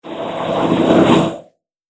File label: Swoosh Whoosh